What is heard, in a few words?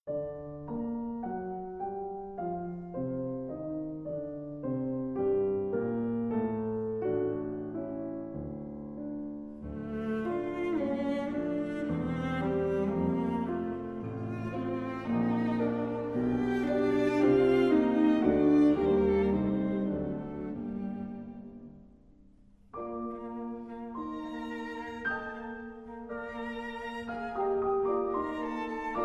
Piano Quartet in G Minor - Mvt. 1. Allegro